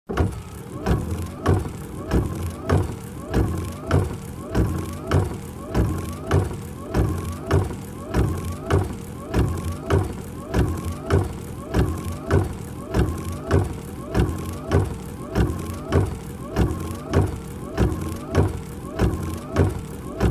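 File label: Car windshield wipers sound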